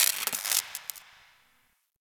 Derelict Spark One Off; Interior; Ambience; Warframe; Orokin Derelict

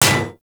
Bullet Impact Generic Enemy Shield

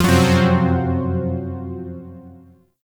Shawzin Hirajoshi Chord F; Warframe; Zariman Shawzin; Chords

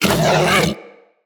Predasite Vocal Pain; Characters; Hunting Critters

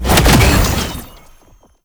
Boss Web Stomp Cast; Enemies; Abilities; Warframe; Oraxia

